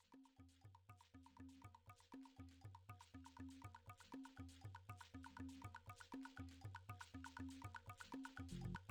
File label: song